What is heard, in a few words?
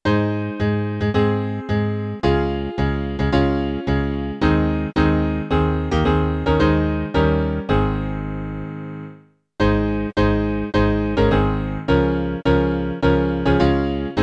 Leaning on the Everlasting Arms, piano